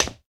glass step; Golden Crayon Sounds

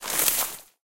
thick forest step; Golden Crayon Sounds

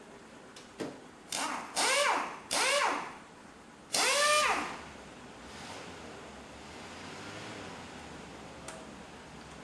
repair; RS Games Sounds

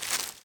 leaves step; Golden Crayon Sounds

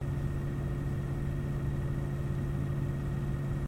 desktop; Golden Crayon Sounds

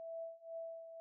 scan loop; iOS Sounds

